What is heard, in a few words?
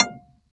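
clavinet; Roland LCDP10 Keys of the 60s and 70s 2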